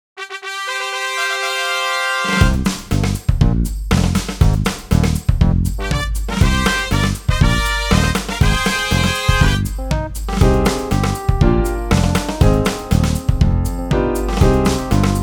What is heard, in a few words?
instrumental; Tirant tirant; La Cubana